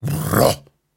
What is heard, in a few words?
Grunts and Chants, Viking Vocal